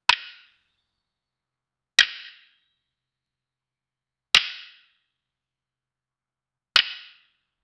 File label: cricket ball striking mid